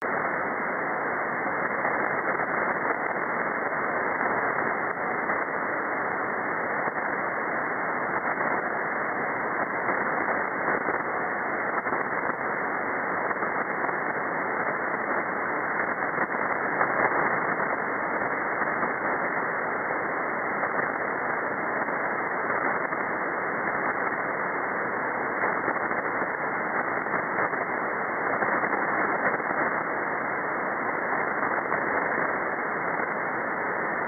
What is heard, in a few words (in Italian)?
Il suono degli 80 Metri